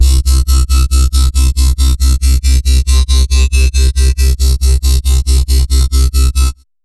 Bassline C3 140, Dubstep; GhostHack

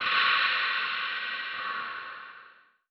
Wet Percussion (Warp)

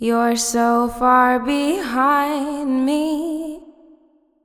You're so far behind me, 128BPM, female vocals; Advent Calendar 2017 Day 1

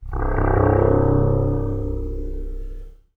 BASS (C), Pedal One Shots; Exchange; Cymatics; ANALOG EVOLUTION Beta

